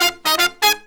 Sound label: Pop & Funk Brass